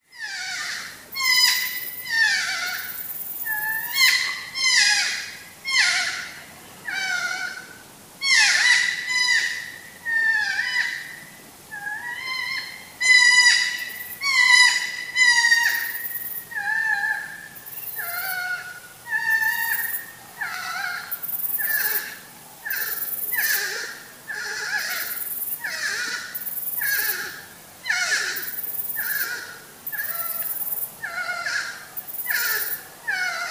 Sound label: porcupine; kim dement